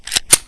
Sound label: cock mp40